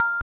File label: BELL